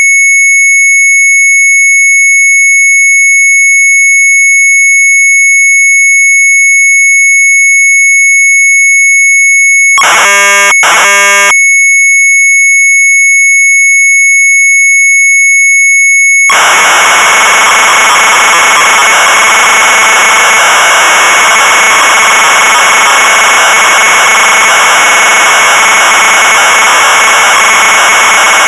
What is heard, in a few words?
MZ800 Tape; BAKKERS DOZIJN; retromelsarchive; sharp mz800